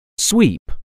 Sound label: sweep